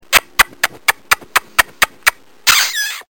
kiss

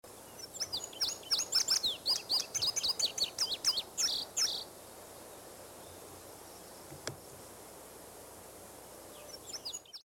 indigo bunting

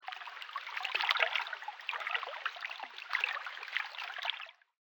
water

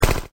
machine gun shot

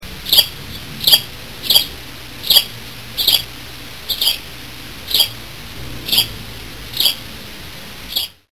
sparrow

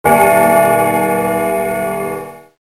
big ben chime

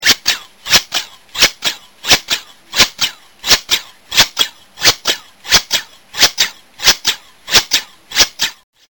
hedge shear sound

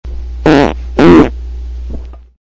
fart

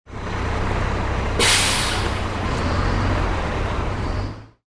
Bus Air Brake Release

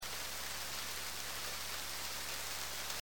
rain thunder

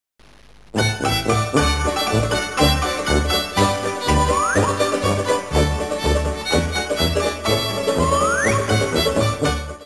piano

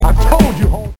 startup

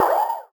cop car

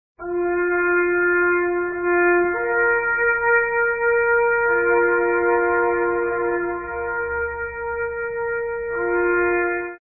bugle calls taps

